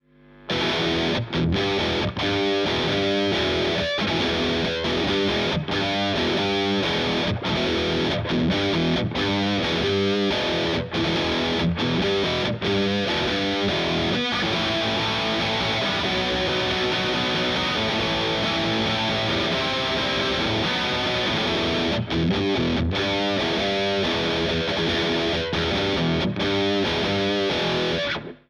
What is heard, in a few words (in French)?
sature tout à fond; bogner alchemist